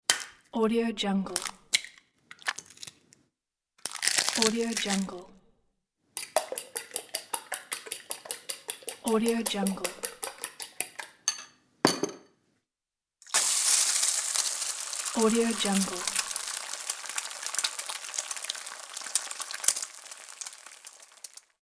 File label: eggs